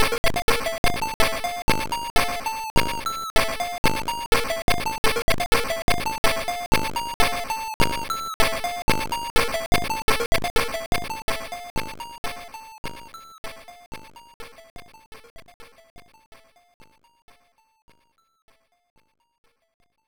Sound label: Knock Jingle; A-Team; Master System